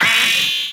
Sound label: Amalgam Moa Vocal Pain